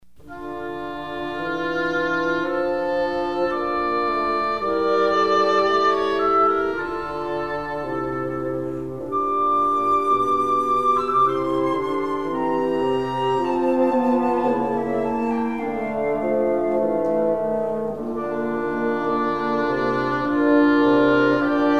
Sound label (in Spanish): Sinfonia; Albores musicales; Op 36; partituras